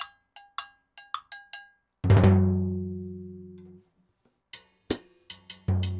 timb cow 80 bpm, march, latin

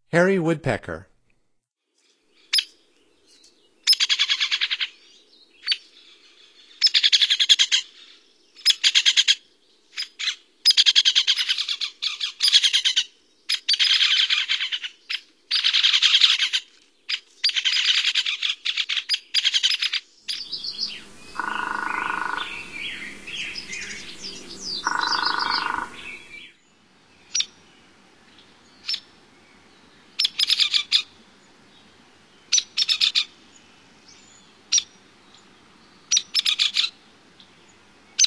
Hairy Woodpecker, Songs of Birds of North America; Stokes

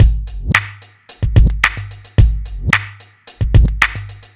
r n b kit 110

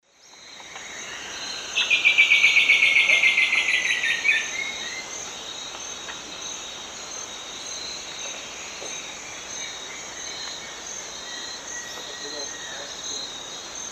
Xiphorhynchus elegans, song; S. America; Eastern Lowlands

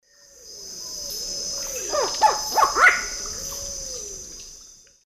Heliornis fulica, calls; S. America; Eastern Lowlands

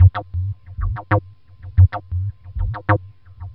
Synth, Techno

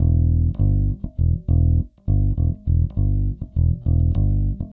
Stingray, Rock Bass; Old Grey Stingray

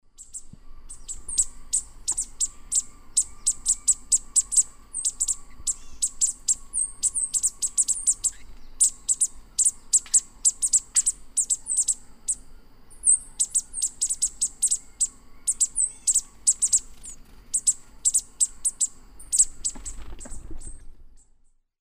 Cyanerpes caeruleus, scolds pair; S. America; Eastern Lowlands

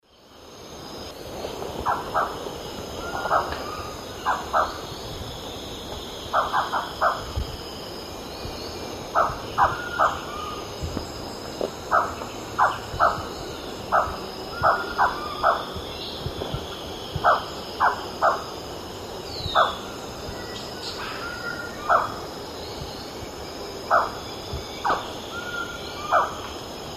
Thamnophilus aethiops, call; S. America; Eastern Lowlands

